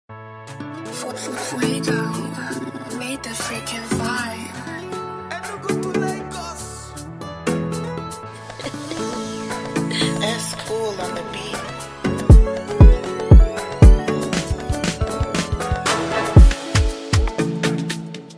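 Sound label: Afrobeats type beat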